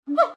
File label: animal monkey